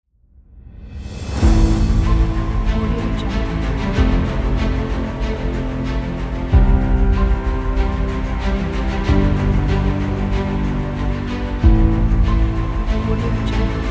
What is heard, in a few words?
epic trailer; edrecords